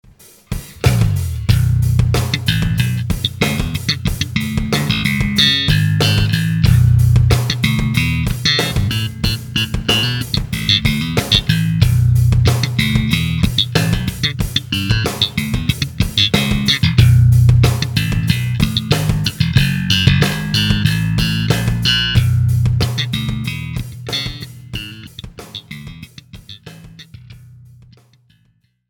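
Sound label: Slap, Bass Driver D.I.; sansamp; Musicians' Friend Slap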